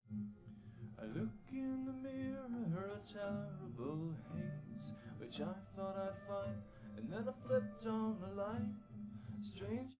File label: nodrums liverec 250ms 5cm; SiSEC11; underdetermined